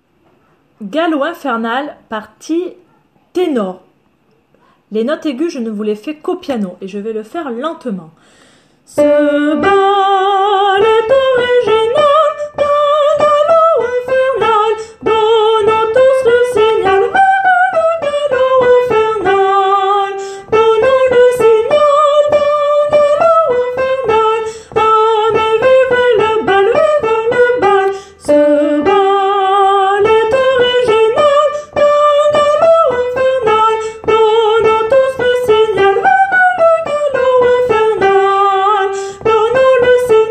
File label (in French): Ténor; Galop infernal